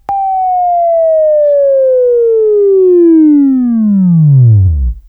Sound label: Buzz, Left; Sweeping Training